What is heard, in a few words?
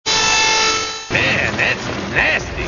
saw; hl2dm